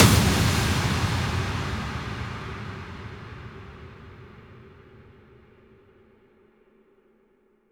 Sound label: Impacts, Effects